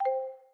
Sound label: reel hold off, Fruit Pot sounds